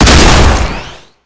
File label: revivegun